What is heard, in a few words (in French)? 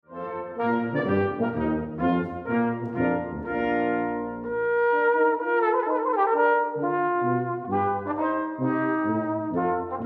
concerto trombone alto